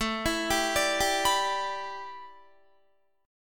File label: A 7th Suspended 2nd Suspended 4th Guitar